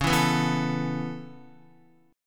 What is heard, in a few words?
C sharp Minor 7th Sharp 5th C sharp Guitar; C#m7#5; strummed; JGuitar